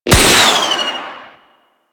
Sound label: Fortress Rocket Fire; Ambience; Warframe; Grineer Fortress